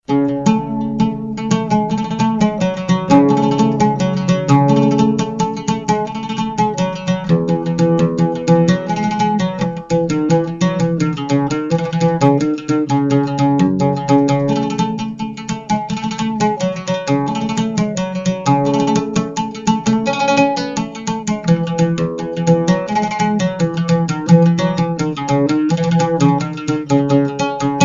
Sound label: Arabic guitar, tuning